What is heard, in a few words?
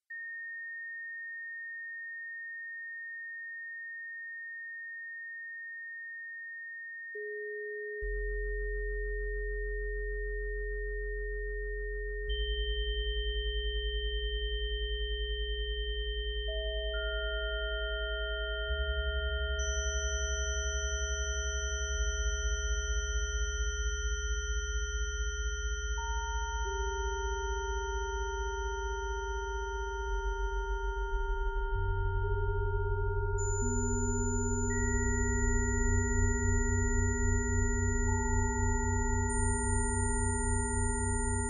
gamma chimes